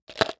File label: Household Pen Drop In Cup Multiple